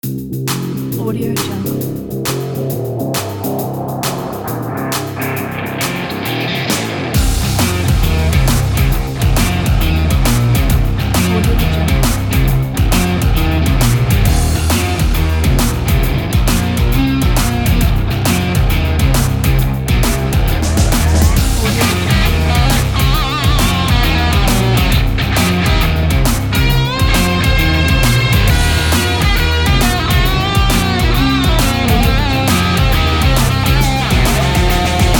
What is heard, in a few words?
rock n roll theme